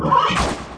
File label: attack; chimera fulgor